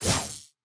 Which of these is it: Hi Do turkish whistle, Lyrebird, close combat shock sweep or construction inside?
close combat shock sweep